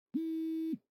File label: button vibro